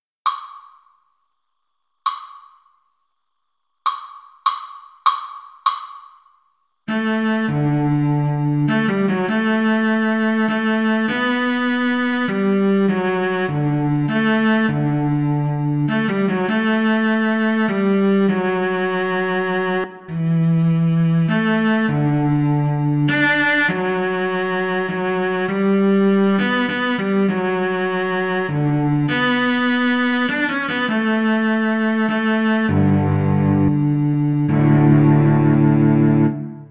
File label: amazing grace, Violin Cello Duet